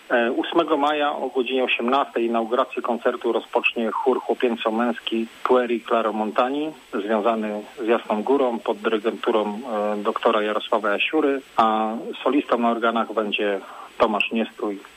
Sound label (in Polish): organy; doxacafe; kluczbork